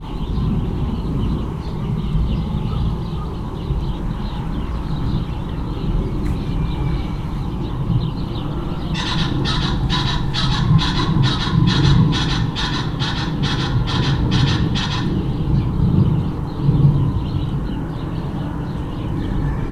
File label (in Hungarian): élesen rikolt, fácán; máta; Hortobágyi Nemzeti Park 2013